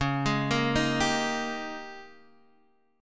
Ab Major 7th Suspended 2nd Suspended 4th Db, Guitar